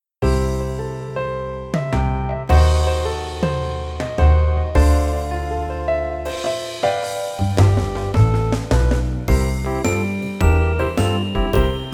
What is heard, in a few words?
boogie c clarinet